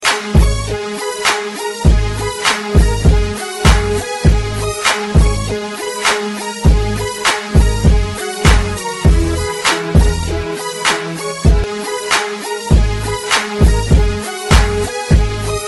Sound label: Gangsta Rap Instru